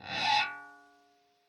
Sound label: scrape, glowly bass